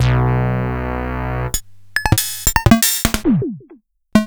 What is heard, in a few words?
Casio SK1